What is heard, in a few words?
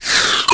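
Block Slide; Finding Nemo; XBOX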